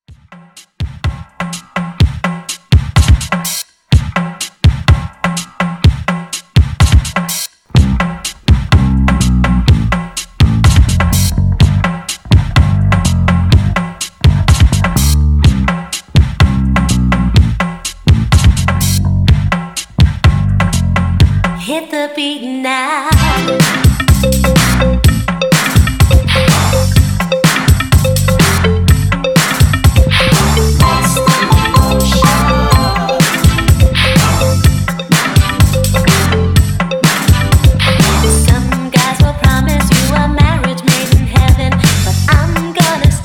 Re drum; remixplanet

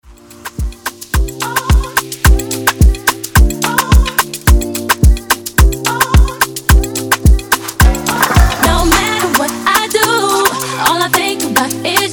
dancehall breaker 108 bpm

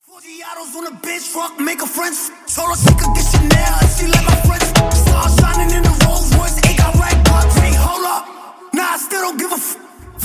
Moombahton Flip; Remixfreakz

